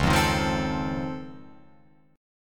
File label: Db Augmented Major 9th, down, Guitar, Open F; strummed; JGuitar